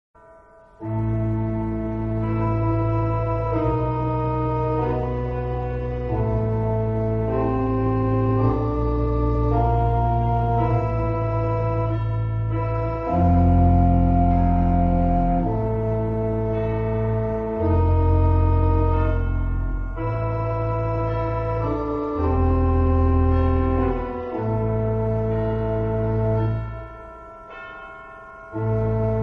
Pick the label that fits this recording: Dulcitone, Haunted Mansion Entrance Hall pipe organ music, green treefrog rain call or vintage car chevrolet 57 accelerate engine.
Haunted Mansion Entrance Hall pipe organ music